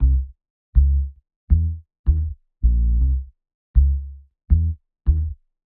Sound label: Synth Bass